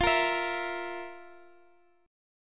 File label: F Major 7th Suspended 4th